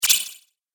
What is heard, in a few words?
Cartoon Magic Spell